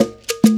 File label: Cowbells